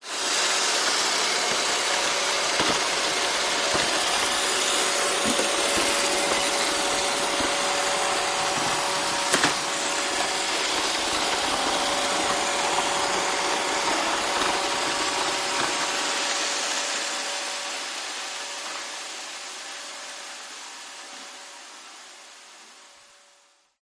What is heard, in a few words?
household vacuum robot vacuum close by then away tiled floor